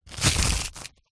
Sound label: foley dirt thin layer scrapes